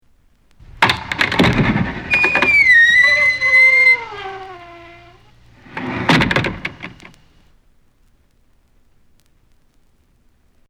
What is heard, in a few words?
Squeaky Door; Halloween; Haunted House